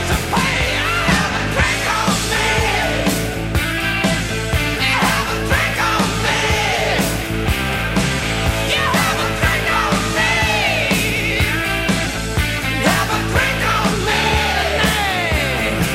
drink; hl2dm

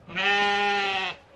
sheep

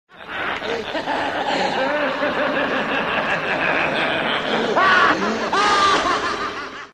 laughs; taunt packs; darkness

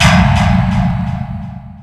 scare; tunnels

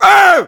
"eeeh"

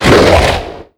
baron voice; doom4

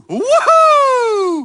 jump; freak fortress 2; chuck greene

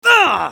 pain sharp, hurt; hl2dm; scout

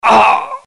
grunt light; tf; max payne

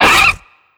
minion explo death; tf; slender; attack of the radioactive thing

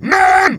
jump; freak fortress 2; meemswarm; heavy

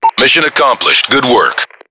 win; payday

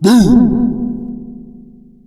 halloween boo, ghost

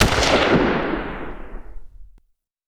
rifle shot; hl2dm; oppressive territory

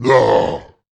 male boomer pain, zombie; Left 4 Fortress

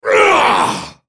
spellcast effort; Volibear

